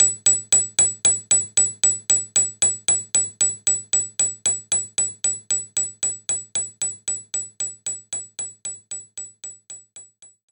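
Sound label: Organ Percussion F#5; knights try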